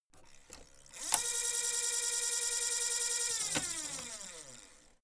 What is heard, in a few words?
servo move high